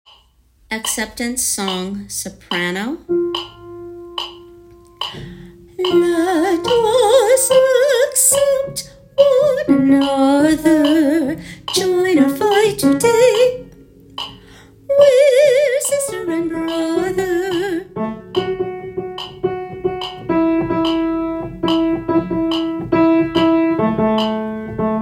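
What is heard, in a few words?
The Acceptance Song Soprano; Broadway Shabbat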